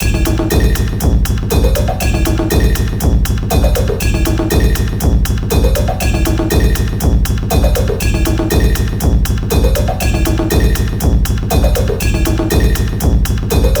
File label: taiko; Color Capital